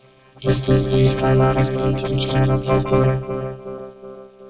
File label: echo